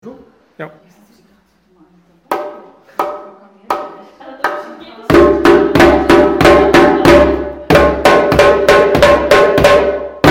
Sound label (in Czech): Bubny, djembe